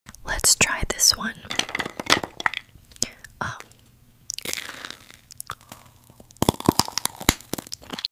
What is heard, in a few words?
Chewing ice